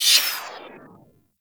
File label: Skate Stride; Enemies; Skate Movement; Corpus Modular; Warframe; Corpus Modular Crewman